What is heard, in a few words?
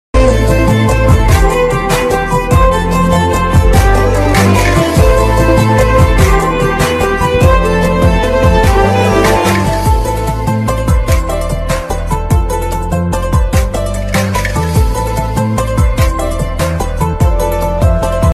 bgm ringtone violin bgm